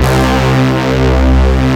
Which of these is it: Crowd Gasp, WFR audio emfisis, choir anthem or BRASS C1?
BRASS C1